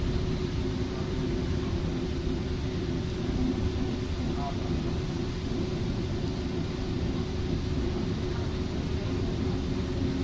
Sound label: Metro slow